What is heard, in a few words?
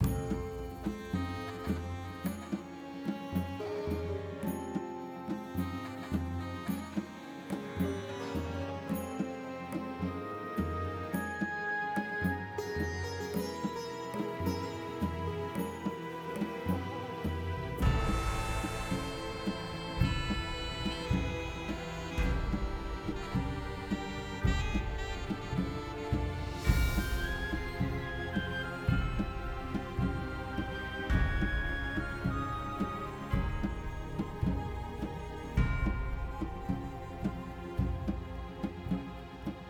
dungeon; Fate The Cursed King